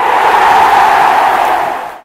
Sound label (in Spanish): publico; footbrain